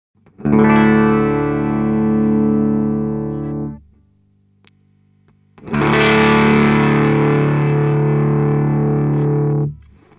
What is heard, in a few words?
clean Harmonic 1 lower gain; Korg Nu Tekt HD S